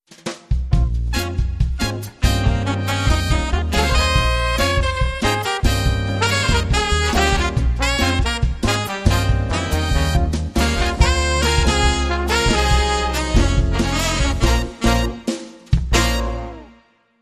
Latin Brass

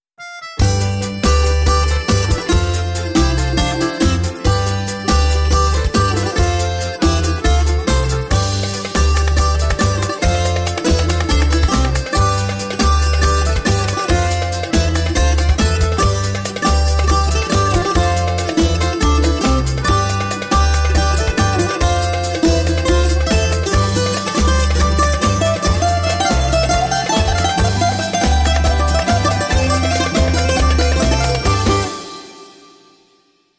Glenti

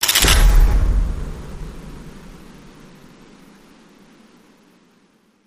Blast and Burn

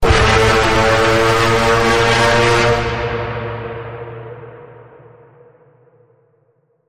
Bend, A

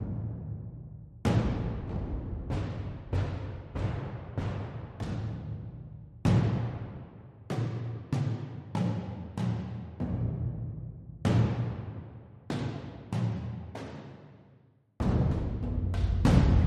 Powerful War Drums

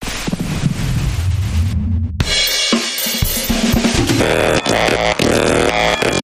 Hard Tumble Electronic Bumper

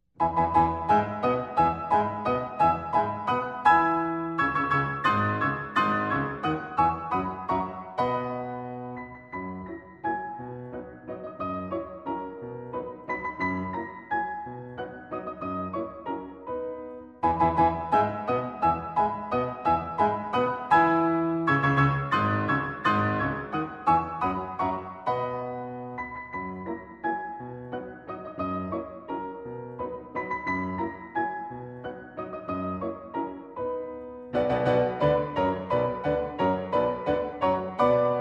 German Dances; Franz Schubert

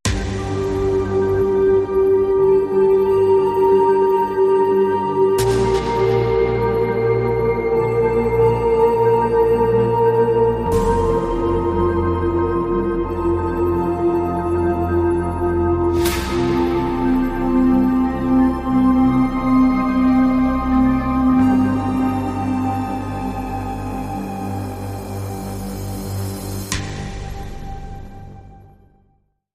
Distant Storms

